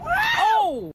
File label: scream oh